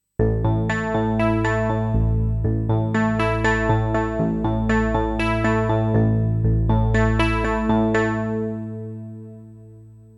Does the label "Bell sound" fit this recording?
Yes